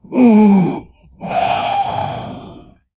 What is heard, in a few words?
old man groaning with gre; sound effect